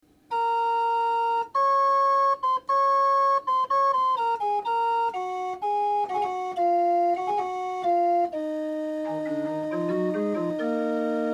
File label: psalm, huisorgel; psalm 112